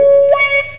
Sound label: MEOW C#, Distortion Gtr; PlatinumPhattCD2; E-MU Producer Series Vol. 8 – Platinum Phatt